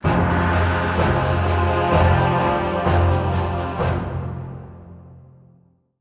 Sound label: Legendary Stinger; Tamsin Roame